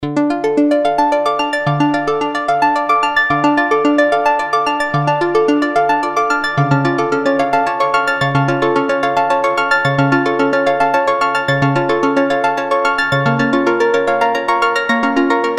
arturia ice of enceladus

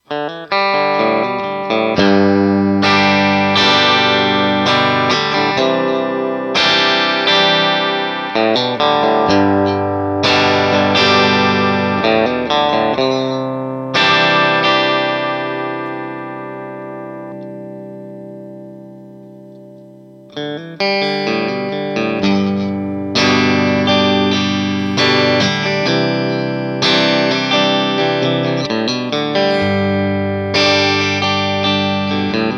clean

